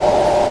thor spin; Tanks

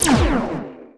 nanobots laser; Tanks

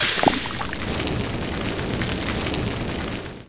glue gun; Tanks

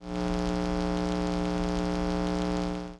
neon blast hum; Tanks

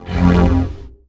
sonic blast; Tanks

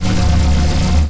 saucer fire; Tanks

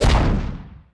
tornado hit; Tanks

